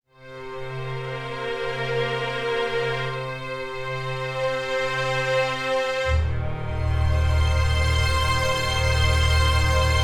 STRING D+B 160 C; eJay; Drum+Bass

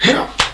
KICK; BERMUDA

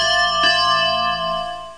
chime

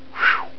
Miss; WhackIt! Weenie Edition

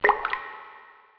sewer drop; Grounch

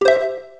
match; PC Play and Learn

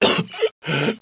choke; player; blade

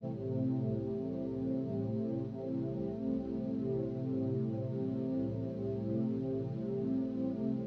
synth pad, ethno, 125, A; eJay; Sphere

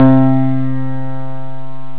PIANO

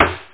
stick

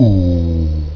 die; Cube

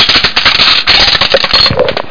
turn

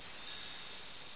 CHIMES; 10,000 Graphics Pack Volume 1; Media Graphics International

